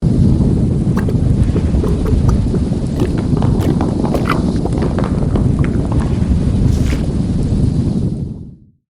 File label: mudslide gurgly; SFX; DailySounds